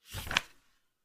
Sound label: flip sound